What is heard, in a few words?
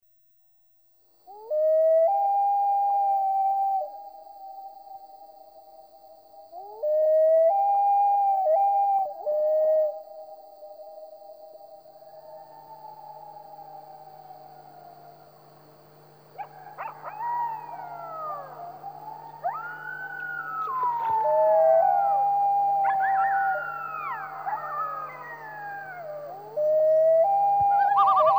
Wails During A Thunderstorm, loon